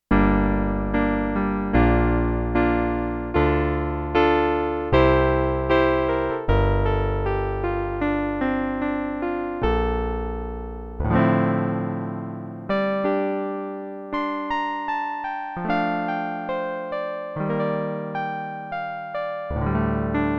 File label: Piano, Roland JX-8P